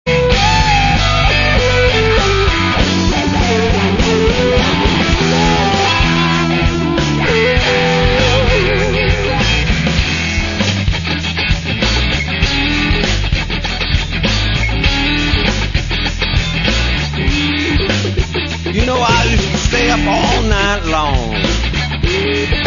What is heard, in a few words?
guitar